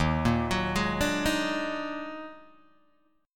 D sharp Minor Major 11th, Guitar, Open G

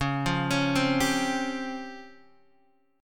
Db Major 7th Flat 5th, Guitar DADGAD

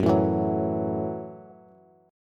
F sharp Minor 7th F sharp, Bass

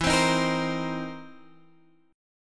C Minor 7th E; Cm7/E strummed

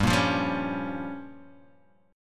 G Minor 7th Flat 5th

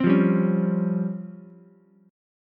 Db Major 7th Flat 5th, Ukulele; DbM7b5 strummed; JGuitar

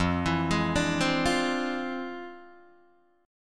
F Minor Major 13th, Guitar, DADGAD

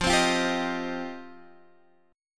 F 7th Suspended 2nd F down Guitar Half Step Down; F7sus2

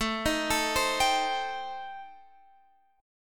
A-Minor 7th Flat 5th, Guitar Standard, chord; arpeggiated; JGuitar

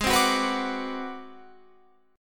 Ab 7th Sharp 9th Flat 5th Guitar Open F, chord; Ab7#9b5 strummed; JGuitar

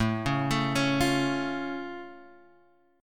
A Augmented A down

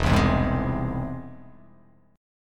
E Augmented; E+ strummed; JGuitar

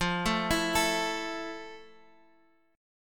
F Major 7th F, Guitar, Half Step Down

